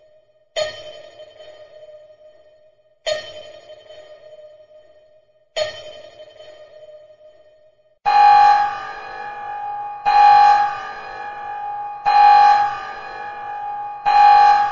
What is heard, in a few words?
Swan Alarm